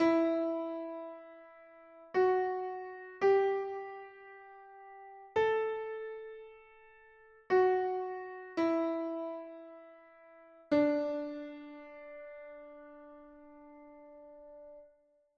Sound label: more exotic modal texture, music